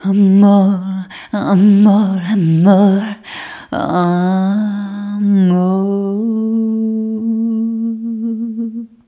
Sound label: Rhythmic G